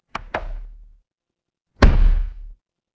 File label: office stapler